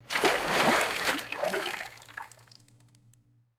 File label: heavy water sloshing in a large tub